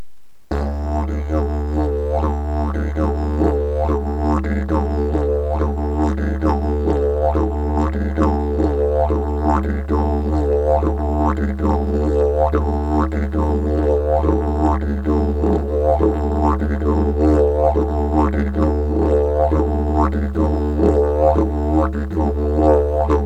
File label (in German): Hoerprobe Begleitung, didgeridoo